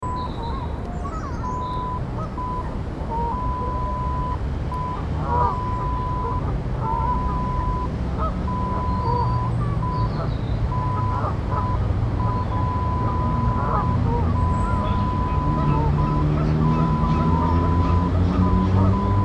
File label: Leyton Lake Traffic Geese